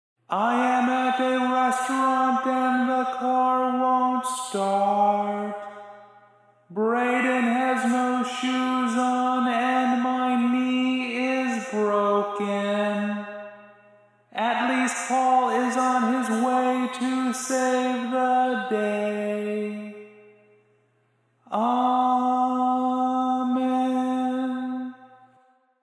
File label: social chant